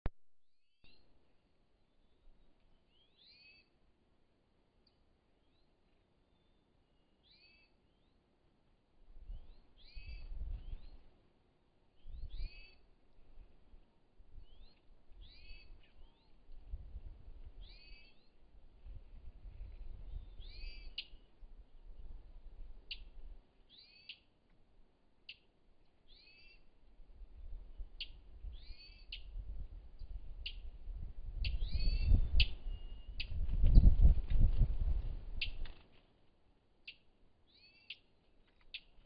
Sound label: more lynx